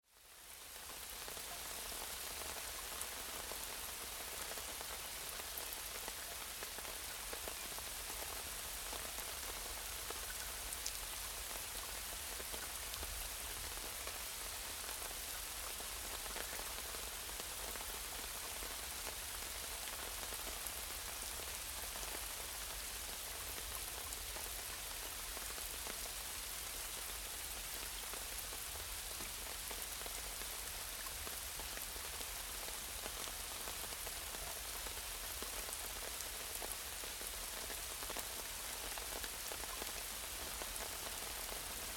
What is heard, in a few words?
ambient rain